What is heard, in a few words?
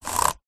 technology computer keyboard bluetooth fingers run across keys